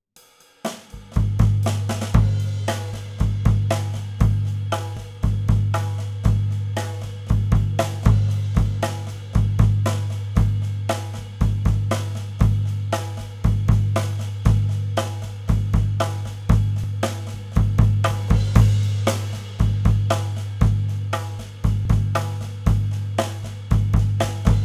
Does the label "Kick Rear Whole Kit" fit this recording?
Yes